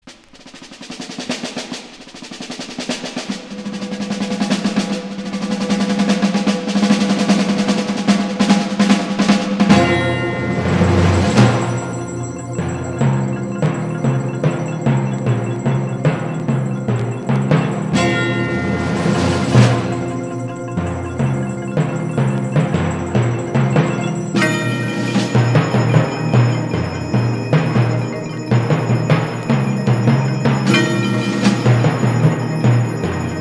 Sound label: PERCUSSION ENSEMBLE